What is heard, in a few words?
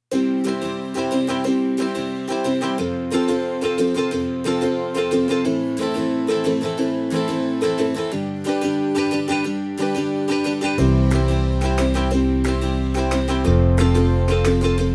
Ukelele corporate fun